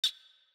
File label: click; FMOD Project; CaiStuff; UI